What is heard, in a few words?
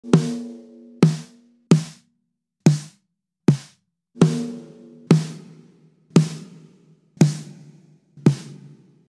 Snare Drum; اسنیر درام